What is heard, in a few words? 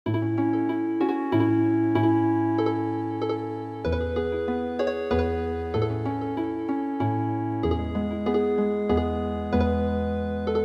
Healin Harp